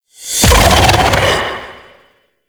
Mummy Sandstorm Cast; Warframe

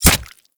Kunai Impact Flesh; Warframe; Hit Effects; weapons

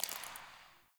Derelict Interior Water Drip

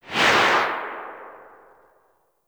Arch Wing Atmosphere Flyby Right; Vehicles; Movement

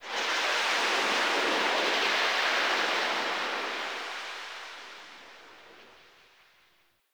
Wind One Off; Ambience; Warframe; Sacrifice; Vitruvian Memory

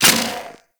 Grenade Launcher Reload, Weapons; Warframe; Corpus

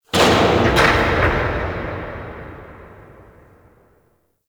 Gas City Distant Metal Latch Huge; Warframe; Corpus Gas City; Ambience

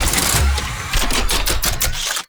Anti Aircraft Gun Charging; Weapons; Warframe; Archwing

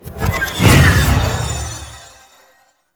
Treasure Ability Cast B; Warframe; Helminth Abilities

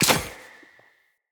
Bow Shot; Weapons; Josalfar Bow

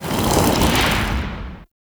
Spit Attack Projectile By; Warframe; The New War; Archon; Nira; Abilities

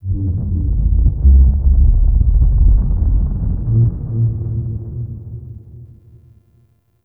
Deep Earth Rumble; Warframe; Tau

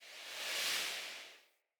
Player Jump Heavy Attack Start Wind

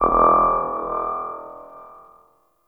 Ominous Techno Hit; Ambience; Warframe; Corpus Railjack

